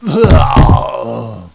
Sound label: death; Alien Arena; hunter